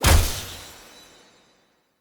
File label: Bow Ranged Shot Charged; Weapons; Player; Bows; Grand Father Bow